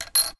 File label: Bolt Rifle Load; Weapons